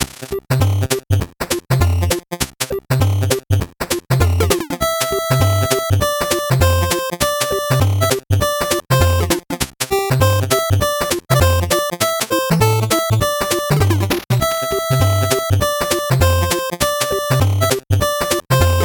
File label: 8bit bgm fast